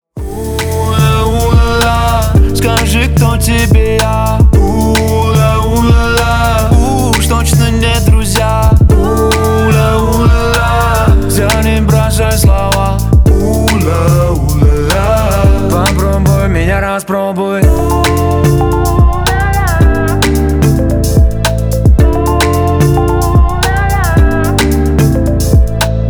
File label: ohh la la, звонкий